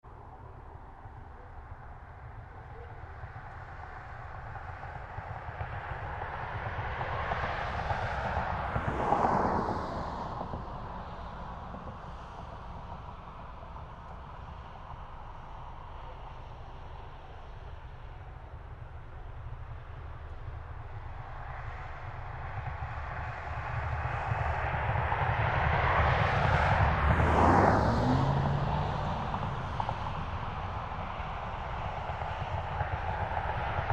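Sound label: Traffic Country Road 2 Lane Bumpy Medium Far; Traffic and Cars; Backgrounds; Big Room Sound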